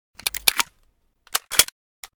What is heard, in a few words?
pp2000 reload, weapons; Blindside's Weapon Reanimation and Rebalance - Military